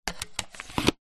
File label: household air conditioner plastic remote slide into wall mounted holder; zapsplat